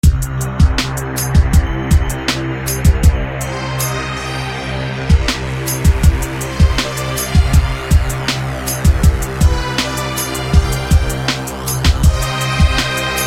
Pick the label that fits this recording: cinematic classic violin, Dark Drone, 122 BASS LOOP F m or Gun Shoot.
Dark Drone